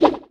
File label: Throw Bomb; King Bulblin throwing a bomb; Eldin